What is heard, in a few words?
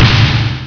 blast